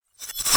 bass

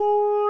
G#5 "o"; 特朗普

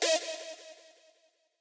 stab

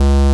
bass C#1

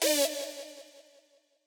stab

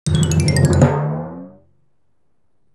GAME TRACK SKIP; maimai

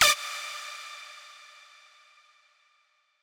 lead

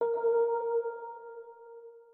organ

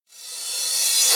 Dr reverse

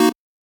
Chord

